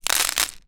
leisure fuse beads drop sprinkle plastic tub lid or tray; zapsplat